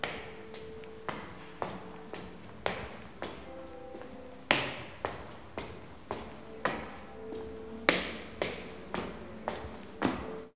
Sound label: Slow Upstairs Walk